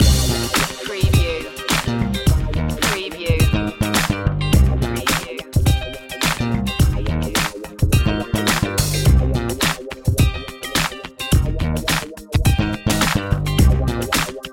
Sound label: funky loop